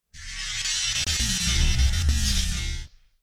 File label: FX camshake